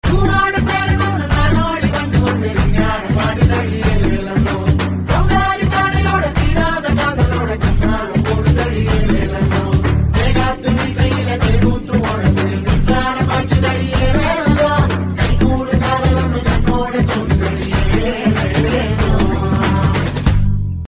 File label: BGM Folk Ringtone; Rowdy Boys